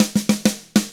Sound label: shuffled, Drums; ILIO; Double Platinum Drums 1